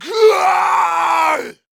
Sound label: Horror Scary Human Male Caveman Angry Scream